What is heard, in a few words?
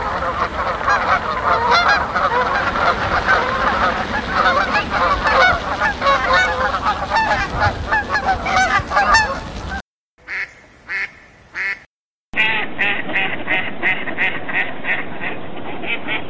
Canada Geese Flight